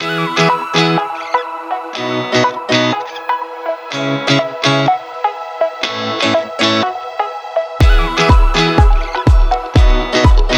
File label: freshness energetic pop upbeat